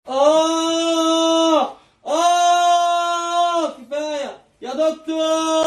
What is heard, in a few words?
pigeon scream